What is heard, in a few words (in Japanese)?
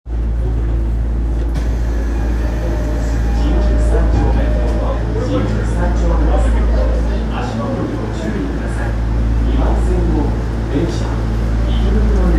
丸ノ内線新宿三丁目東, train sounds